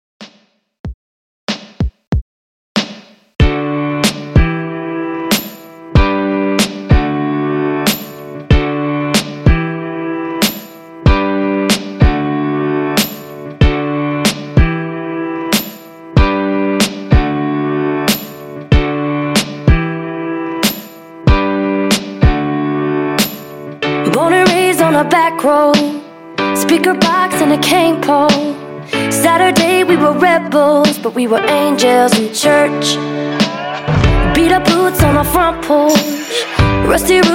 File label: Country Redrum, 94 Bpm